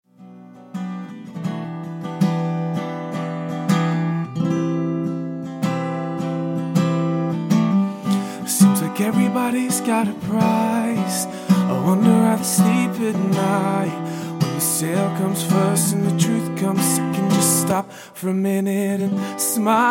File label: Guitar Vocalist